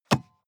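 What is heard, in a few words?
sports car interior compartment close medium; verkehr transport; autos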